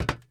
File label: metal box walk; blocks; step